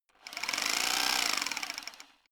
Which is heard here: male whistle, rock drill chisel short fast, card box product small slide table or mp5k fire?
rock drill chisel short fast